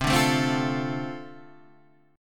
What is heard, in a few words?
D-Minor 7th C, chord